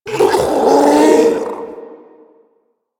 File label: Infested Ancient Strike Scream; Warframe